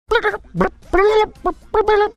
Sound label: making series marsupial gurgle sounds